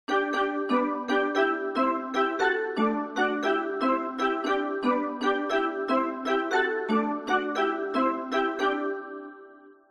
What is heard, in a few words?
vibes steeldrum, Hohner Adam